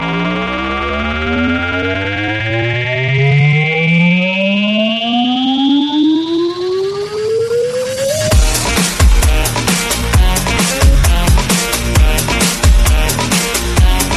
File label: Breakbeat